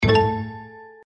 bonus; Stocked Bar

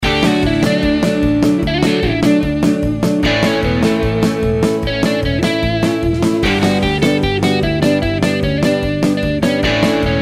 reelspin fg; Route 66

